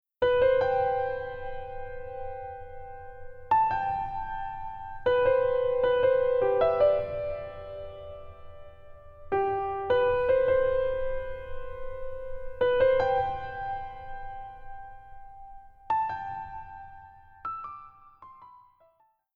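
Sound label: Future Bass Rough Arr Melo Piano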